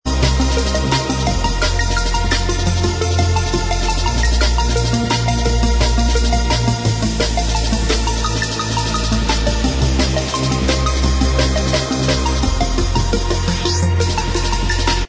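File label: remix instrumental Track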